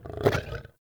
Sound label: Wolf Jump Heavy, Vocals; Animals; Actions